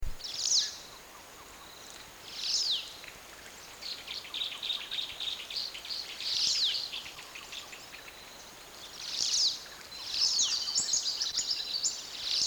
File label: Mascarene martin